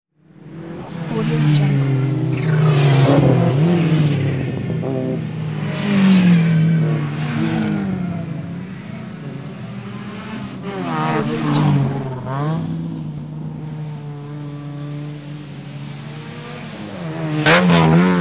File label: sleek race car drives around track